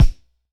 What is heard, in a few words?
Hammer Heavy Focussed, Kicks; Airwindows